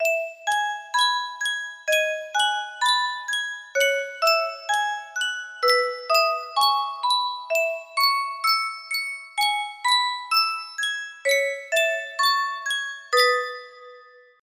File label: Sankyo music box